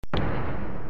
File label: large stomp sound